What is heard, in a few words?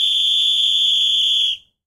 whistle; superstriker